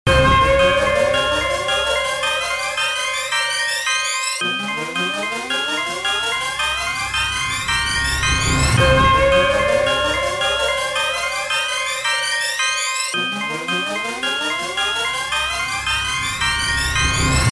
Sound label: Spooky